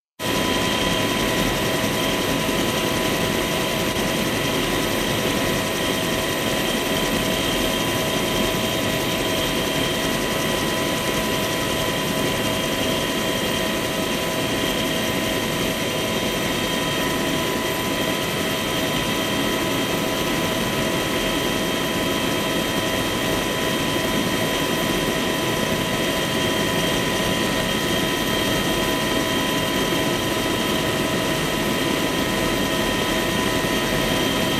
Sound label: Helicopter